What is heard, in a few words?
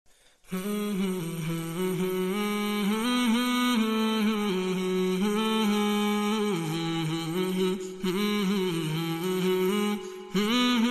instrumental ringtone